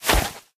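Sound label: inventory items generic; STALKER; IWP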